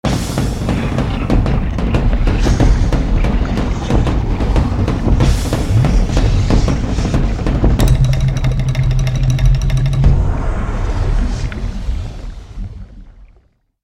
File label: Hectic Lava Cue